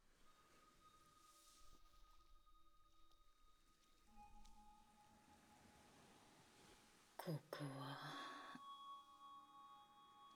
耳かき, 海と雨, ASMR; 海と雨と母

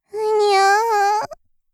目覚める

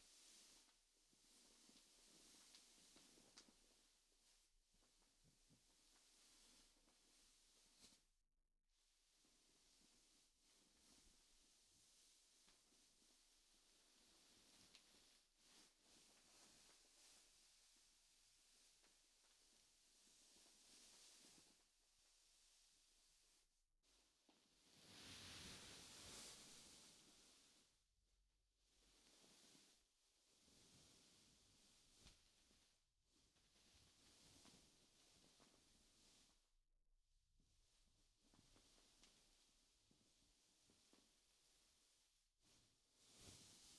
布団をもぞもぞ; 3DIO; 布団の音

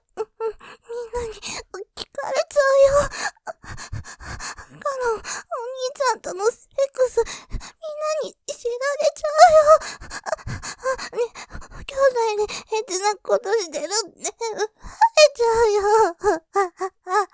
喘ぎ◆みんなに聞こえちゃうよ◆エロさマシマシ, ASMR; モノラル; ループで楽しむ！シェフの気まぐれ抜き抜きコース

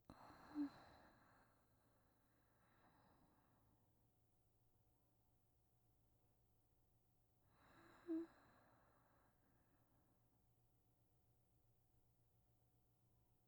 添い寝 セリフ無し; 05添い寝

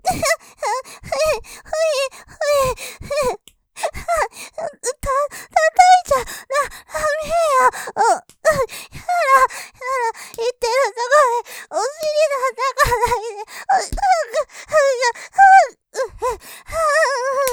喘ぎ; 叩いちゃダメェ；；だめぇ；；いってるところで！; ループで楽しむ！シェフの気まぐれ抜き抜きコース; モノラル

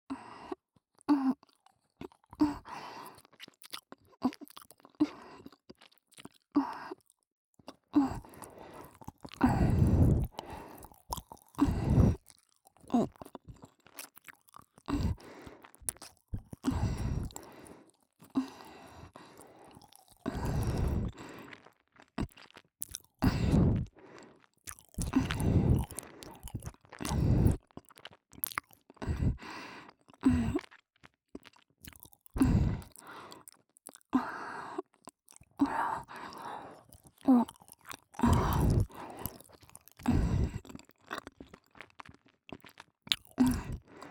ASMR チョコレート; えろぺろ

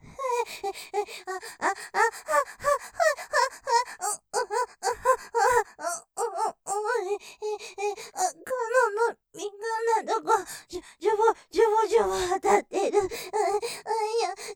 喘ぎ◆敏感なところじゅぼじゅぼあたってる！?; ステレオ

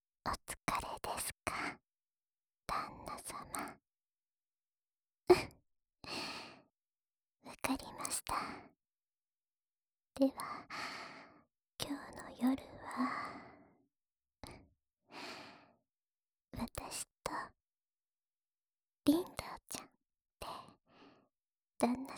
吐息いっぱいの添い寝 両耳, ASMR; ２人と吐息いっぱいの添い寝

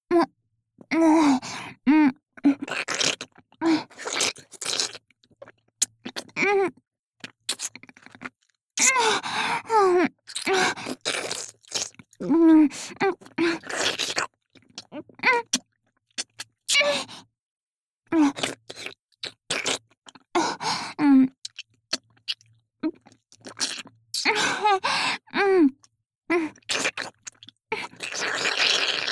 次女 フェラボイス

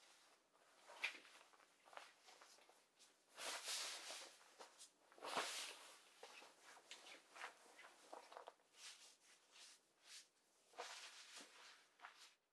ズボン を はく

